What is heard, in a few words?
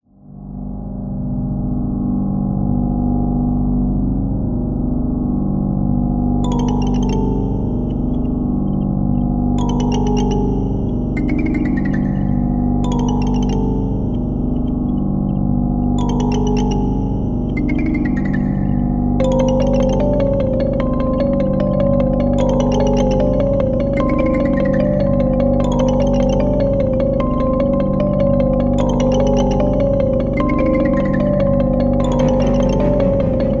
A Broken Toy, horror music box; HauntedBloodlines